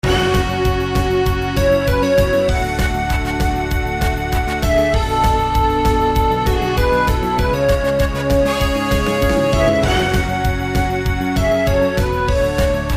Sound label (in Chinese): 战斗 任务